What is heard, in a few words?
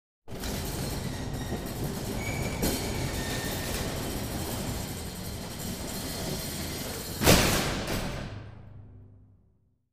The metal compartment door closes